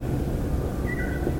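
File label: uguisu, Japanese bush warbler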